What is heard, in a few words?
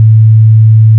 a5 open, Pitch Pipes